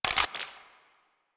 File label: pistol mech; Weapons; Warframe; Corpus; Crp Blastor